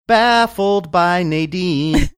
singing

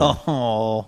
uh ho

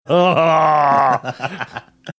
groaning chuckling

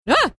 making shocked sound

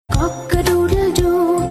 cock a doodle doo

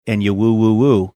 woo woo woo

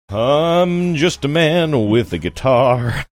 singing im just a man with a guitar

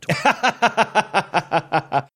laughing

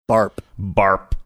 barp barp

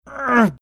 grunting

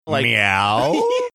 drawn out meow

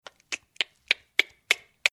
making mouth clicking sounds

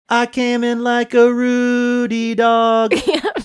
singing

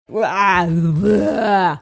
disgusted sound

